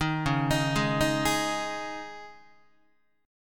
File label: Db Suspended 2nd Guitar 4A,4E,5A,5D,5G sharp,6C sharp; arpeggiated; Dbsus2; JGuitar